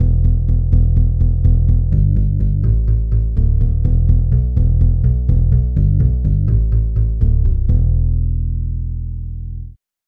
acoustic bass bass

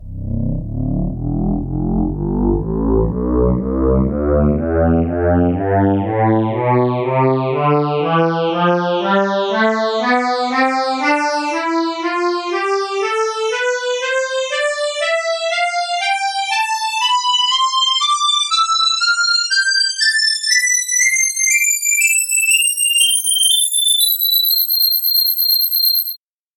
accordian tonleiter samples

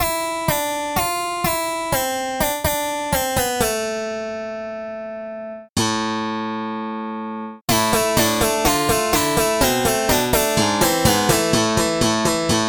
pop bass

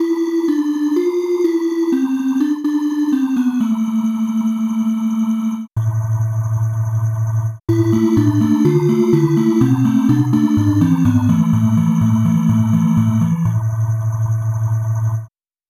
bottle chiff

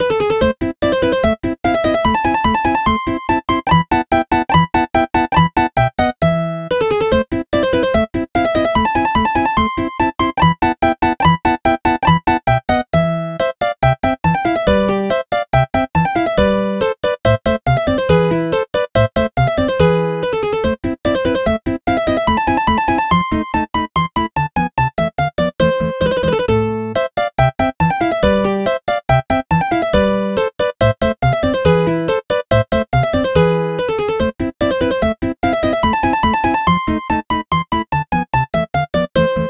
acoustic bass mozart

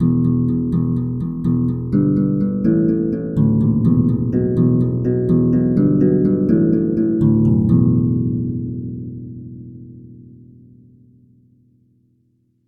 harp bass

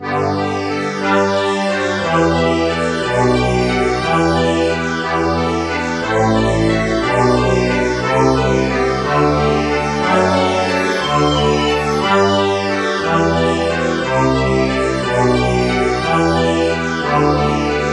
accordian bach

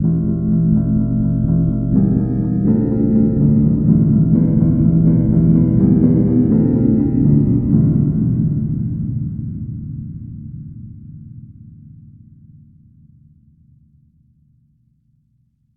tubular bells bass

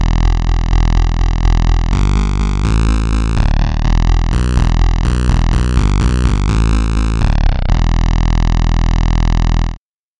fifth sawtooth wave bass

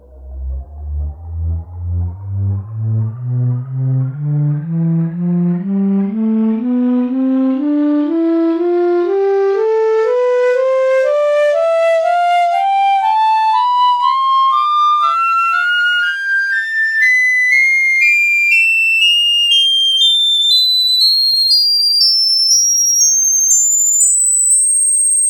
piccolo; tonleiter

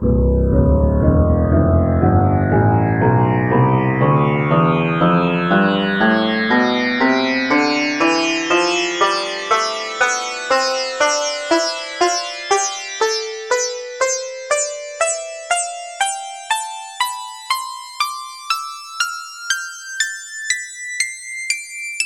sitar tonleiter

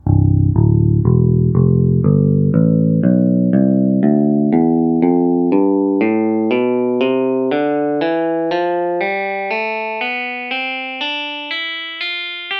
clean guitar tonleiter

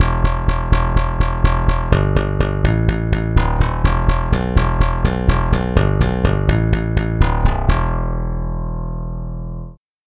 slap bass bass

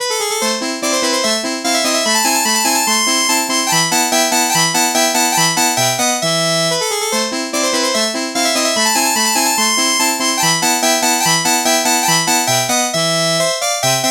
muted trumpet

